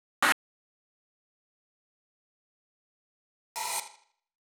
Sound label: 126BPM Cutted; Vengeance Samples; Lineup Kit